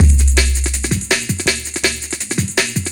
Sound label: Boo Break, 5A, Jungle Breaks; Blu Mar Ten - Jungle Jungle - 1989 to 1999 Samplepack